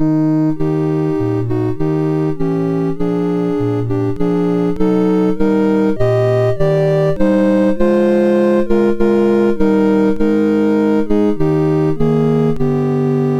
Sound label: I Heard the Bells on Christmas, MOS6581R3